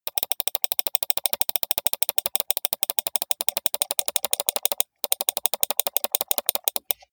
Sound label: computer mouse click sequence steady long